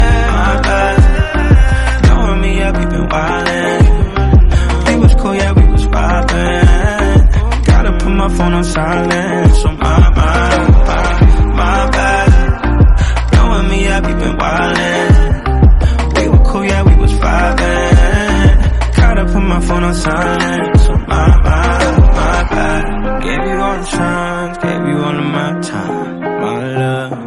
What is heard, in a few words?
cool jazz music english song ringtone